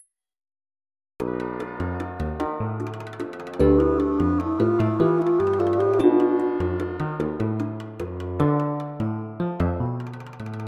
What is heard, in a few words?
drum